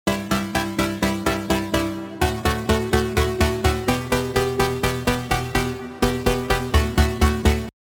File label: break chords low cut